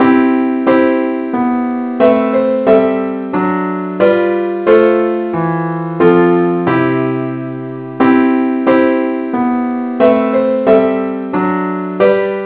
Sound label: parts only key C